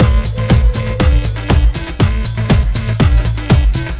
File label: snapper beat; kopovcasino